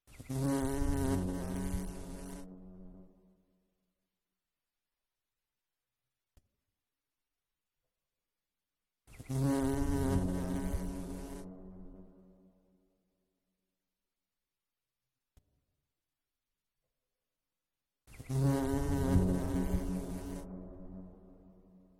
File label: bees buzzing 1 and bees buzzing 2; Sound design for the opera composer: concepts and methods; Her face was of flowers audio cues